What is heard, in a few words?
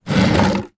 leisure draughts checkers outdoors large plastic piece move slide